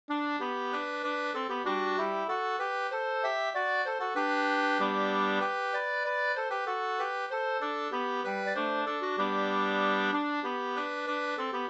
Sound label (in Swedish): skanklat